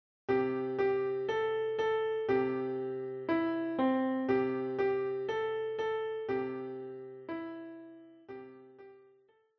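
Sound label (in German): Backe backe Kuchen Klavier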